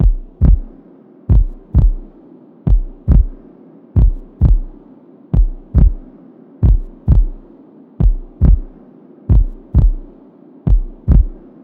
Heartbeat - 90BPM; STEMS; Ghosthack; SkyRealm; Mood Kits; Mood Kit 02 - Afterlife

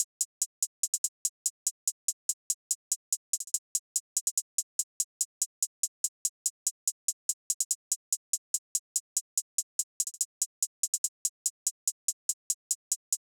Drum Loop - 144 BPM Hihat, Hip Hop; Drum Loops; Loop Stems; Cymatics; Late Drum Loop

